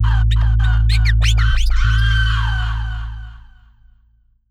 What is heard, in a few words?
100bpm light staccato cello transition